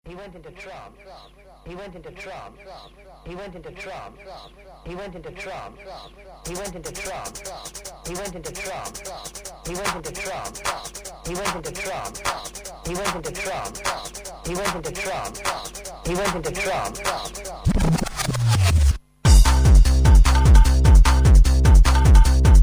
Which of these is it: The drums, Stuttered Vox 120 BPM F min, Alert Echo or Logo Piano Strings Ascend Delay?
The drums